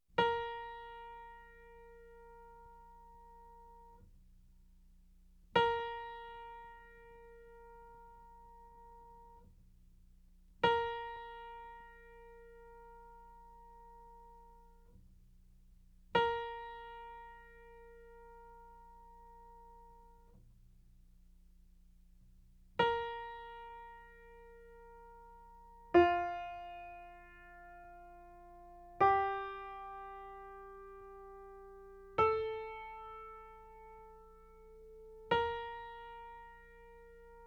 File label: Tuning Notes, Alto Saxophone & Piano; Music For Alto Saxophone & Piano